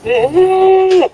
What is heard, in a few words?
zombie